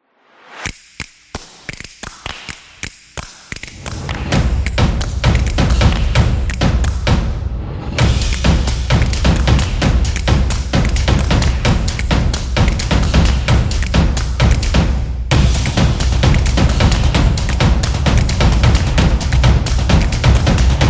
Action Background, drums energy

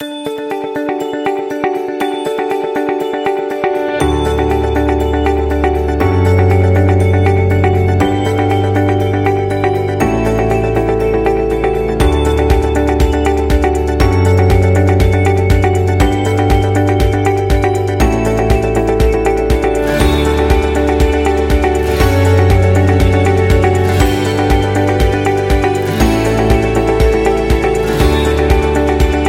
Corporate Happy

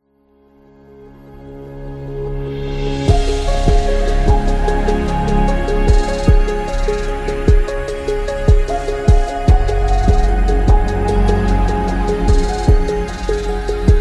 Documentary; self motivation

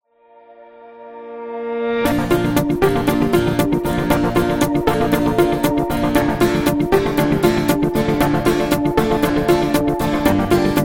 Positive Corporate, corporate music; corporate music vol 6

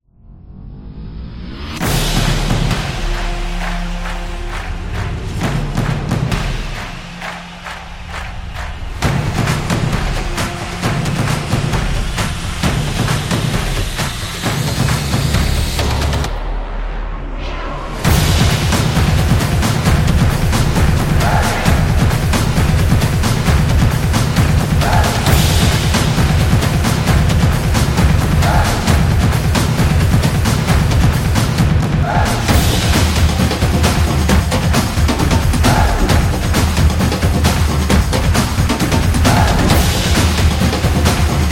This Is Sports Drums

